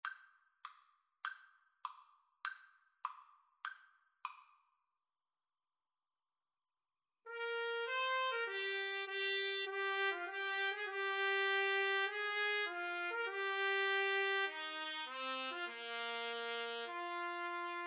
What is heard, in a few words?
trumpet duet